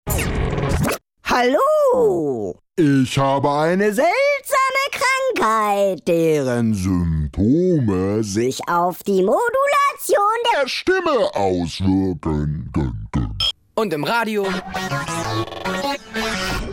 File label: modulation